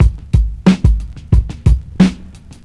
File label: Ensoniq ZR 76 Break Bt 90